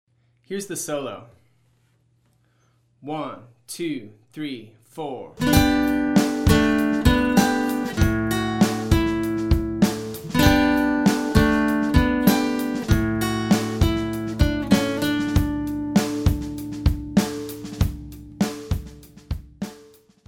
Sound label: Solo - No Rhythm Guitar